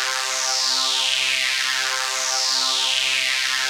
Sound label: Bright Sweep, евродэнс